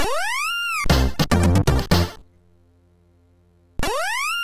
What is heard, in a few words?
Su-Sweet, MOS6581R2